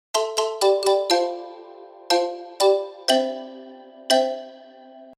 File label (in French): impro xylo; loto sonore